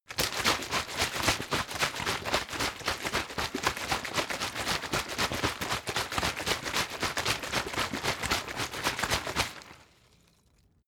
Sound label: bottle water sparse shake; gastronomie; berufe